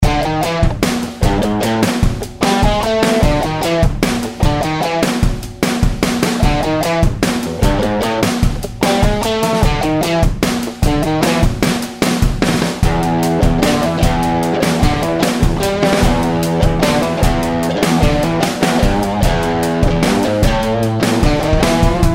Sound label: Rock Guitar Grooves